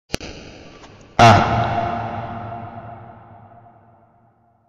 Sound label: ah eco